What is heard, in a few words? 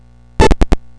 remote control